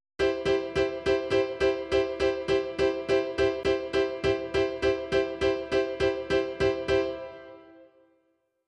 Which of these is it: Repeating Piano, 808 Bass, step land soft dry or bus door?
Repeating Piano